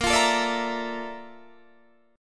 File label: A sharp Major Sharp 11th, Guitar, DADGAD